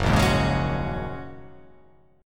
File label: F sharp 6th Add 9th, Guitar; strummed; JGuitar